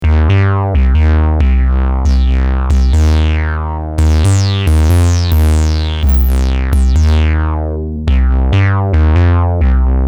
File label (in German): ms20mini bässe